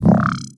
Sci-fi Pulse Gun Blast

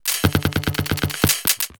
Sci-fi Malfunction Rumble

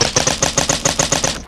Sci-fi Malfunction Rumble

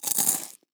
Cracker Bite Crackle

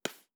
Mouth Puff

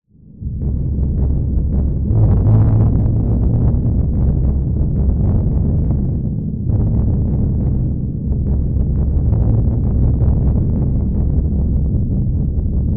Dark Rumbling Ambience

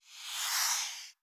Soft Slide Long

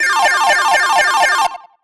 Slot Machine Win

